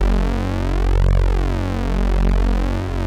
PULZE FLANG, LEADS; Masterbits; Vintage Voltage

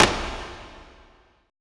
E STEPS, ETH PERC

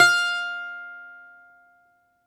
Balalaika, Prima Balalaika, Plucked Strings

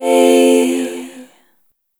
Chord F Major, Vocal Samples; Sonic Foundry (Sony Creative Software); Pocket Diva World Class Vocal Samples

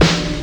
Layzee Snr; Zero-G; Soundclash

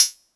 Metro FM, drum kit; Polyend; Stazma & Concrete Collage

